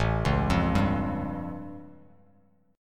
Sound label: A Augmented 7th A Bass Standard; arpeggiated; JGuitar